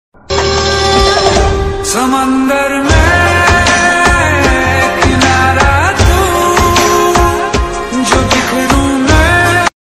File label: Ringtone